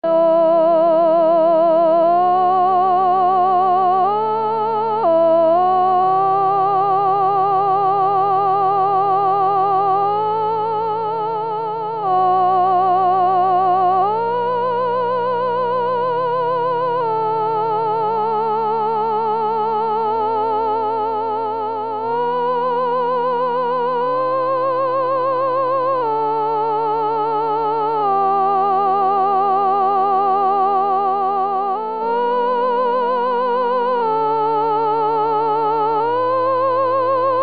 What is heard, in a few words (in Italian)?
Salmo solo coro soprani; voci guida; corali in vaticano 2024